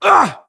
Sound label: horseman wound; svencoop; keenhalloween; horsemen